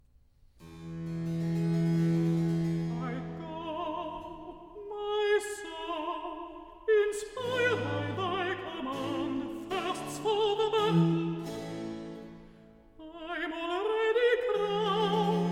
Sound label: Recitative; Act I; Hamor; I go, my soul; Klassika; Handel Masterworks